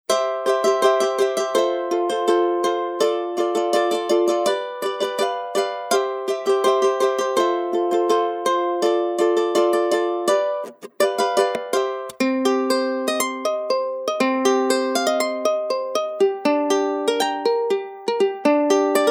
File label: ukulele